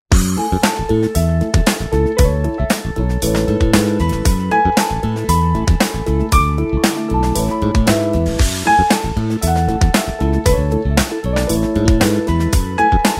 Wersja instrumentalna; Wersja instrumentalna do piosenki; podkład; Krakowskie Centrum Artystyczne

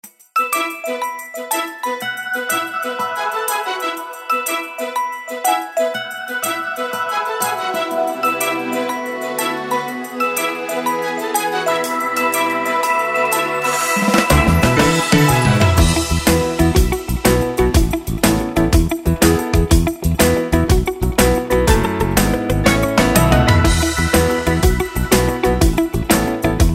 Wersja instrumentalna; Wersja instrumentalna do piosenki; podkład; Krakowskie Centrum Artystyczne

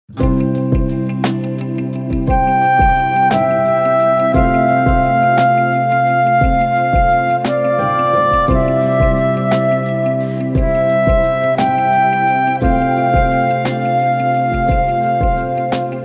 instrumental, wersja instrumentalna / karaoke; Wersja instrumentalna do piosenki; Krakowskie Centrum Artystyczne

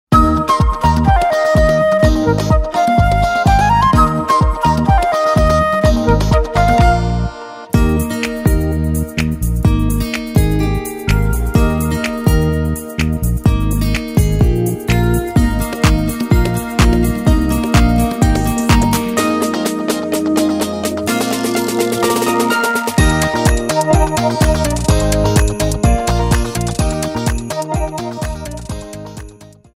Choinka instrumental, wersja instrumentalna / karaoke; Wersja instrumentalna do piosenki; Krakowskie Centrum Artystyczne

